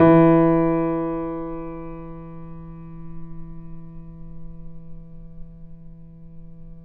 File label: PP E 6, Grand Piano off mic